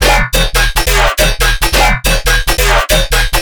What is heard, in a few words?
bass loop E 140; Virtual Riot